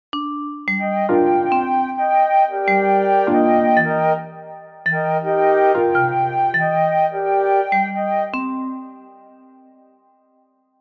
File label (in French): musique; architecture